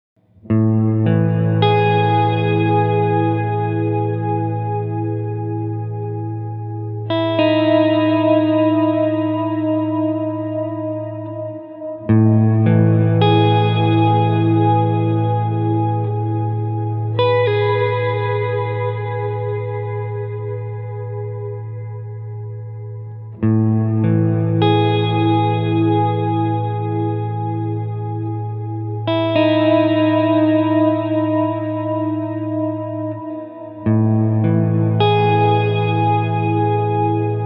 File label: hall, big sky; Closing Time